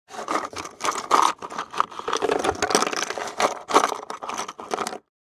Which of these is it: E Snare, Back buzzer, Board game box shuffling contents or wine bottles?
Board game box shuffling contents